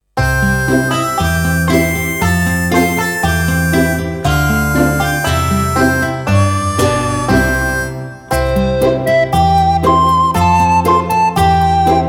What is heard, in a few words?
Alle Vogel sind schon da, recorder